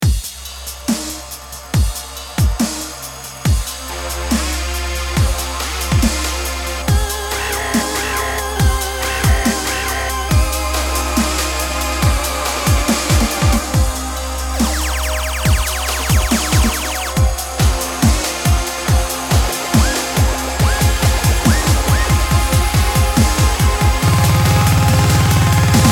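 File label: Dirty Stinking Bass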